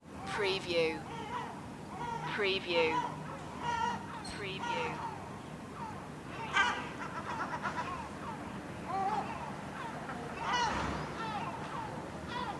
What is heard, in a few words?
rooftop seagulls spain